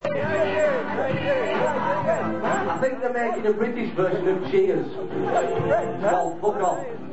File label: cheers